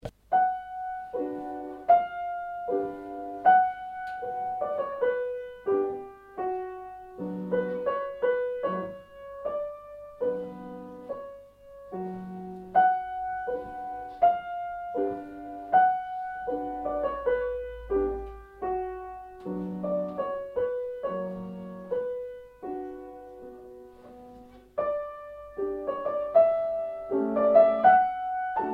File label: Sad song